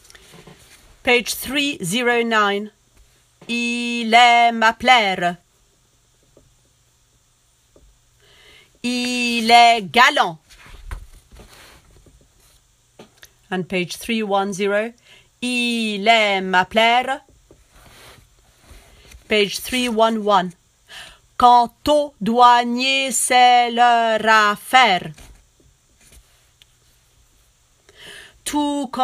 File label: bas; Carmen